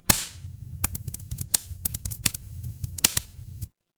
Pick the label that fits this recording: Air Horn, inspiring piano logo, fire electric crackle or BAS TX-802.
fire electric crackle